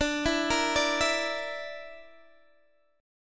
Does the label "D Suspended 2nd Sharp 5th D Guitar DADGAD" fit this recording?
Yes